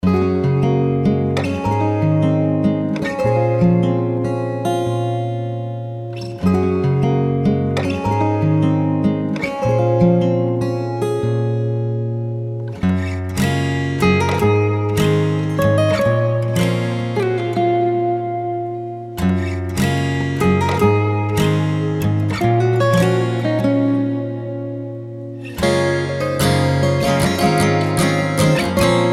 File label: Mellow Acoustic Guitars